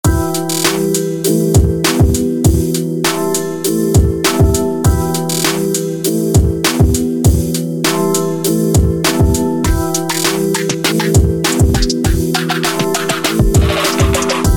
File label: hip hop loop